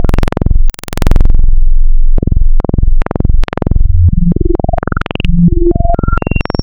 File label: Classic Saw Filtered, wavetables; Architecture 32